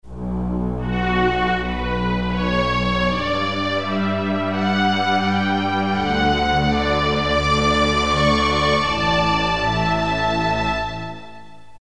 Strings

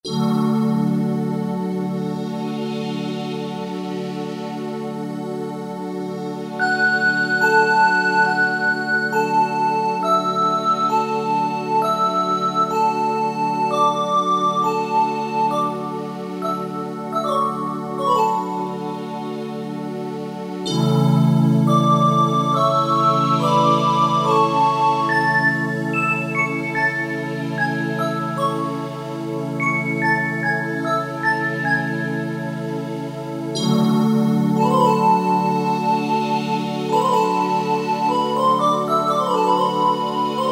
Gentle Winds

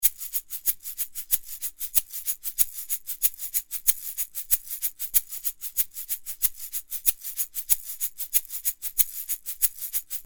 Shaker; Apple GarageBand 2; Apple Loops

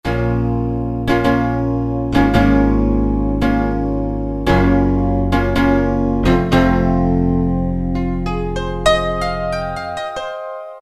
Upright Pianer; Roland PN-D50-02

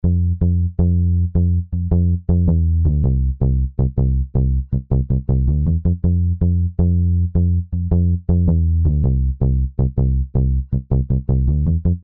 Southern Rock Bass; Apple GarageBand 2; Apple Loops

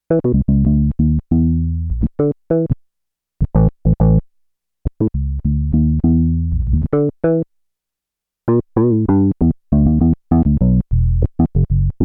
E Bass, Roland JX-8P

